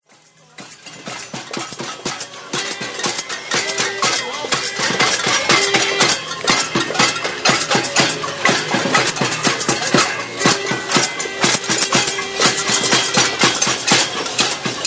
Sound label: casseroles; Parc Jeanne-Mance